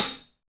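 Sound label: closed hi hat, Roland BK-5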